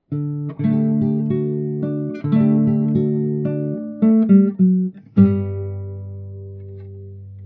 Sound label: short music intro, intro song; The Lambton Worm Gaming Podcast